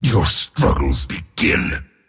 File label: attack pain; dota; mars